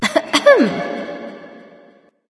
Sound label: heh heh light; smite; voicepack; hel